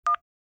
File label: cell phone